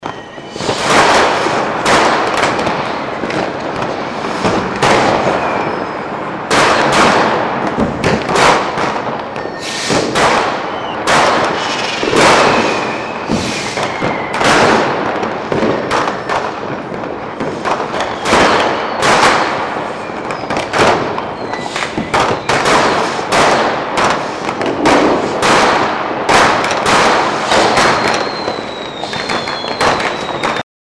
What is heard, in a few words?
fireworks strong basic